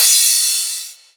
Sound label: Crash, Cymbals